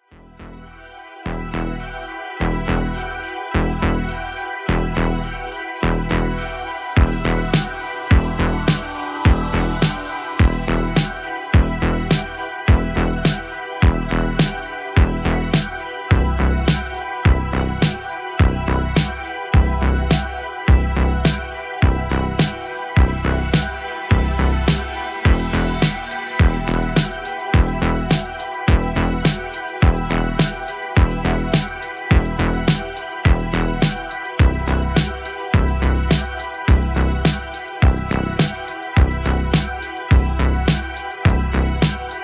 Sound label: Synth Alien Remix